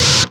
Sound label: FIRE SNR, PHUZZ DRUMS; Spectrasonic Distorted Reality 1